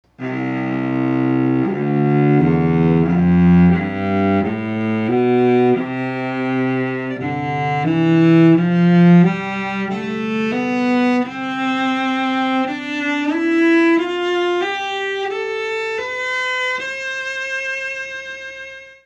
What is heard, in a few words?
Steel, cello, steel endpin